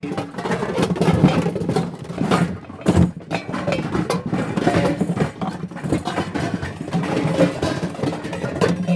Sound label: Rummaging Through Pots And Pans